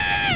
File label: pain; quake2; kennyrim